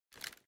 deagle put away; emx kund50

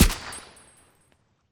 ak47 silence; EMXArms; draftsman

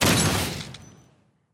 shoot 3p; EMXArms; kund50

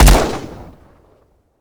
shoot, arms; emx scgraves

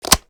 reload mag in, arms; emx atlas

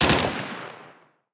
pulse; EMXArms

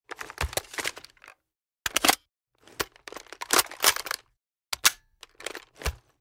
inspect empty

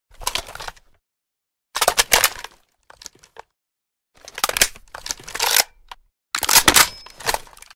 ak47 inspect; EMXArms; draftsman

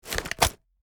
draw, arms; EMXArms; umx32

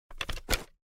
m4a1 draw; emx ares

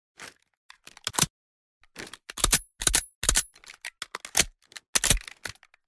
deagle inspect empty; EMXArms; kund50

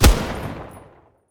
mg90 shoot; EMXArms

